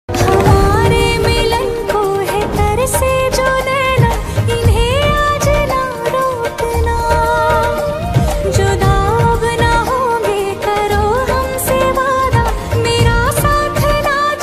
ringtone female version; bhool bhulaiyaa 3

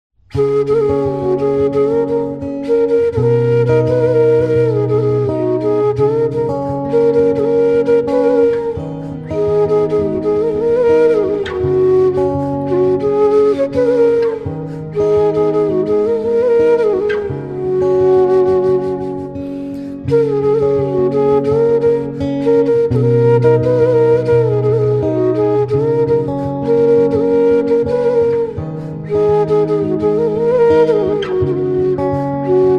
ringtone flute guitar; android

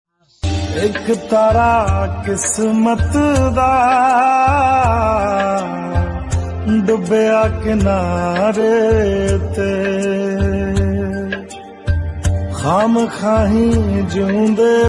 sad status; sadsong

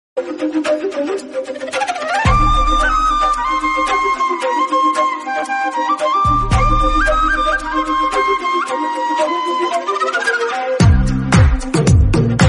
tamil love bgm ringtone; sai pallavi; mca movie

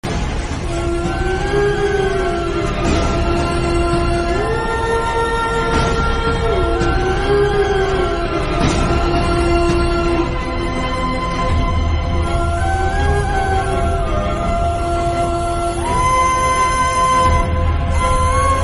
horror ringtone background ringtone; rajmahal 2

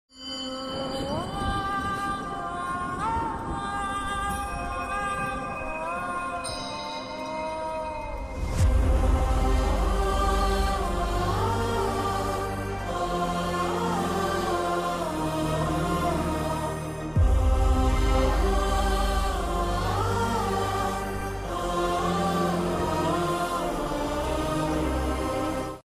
emotional bgm; kanguva; siva

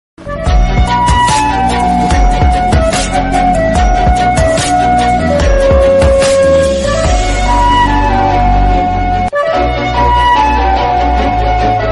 music love ringtone

